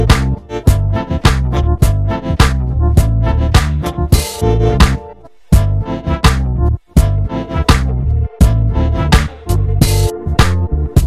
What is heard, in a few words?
Accordion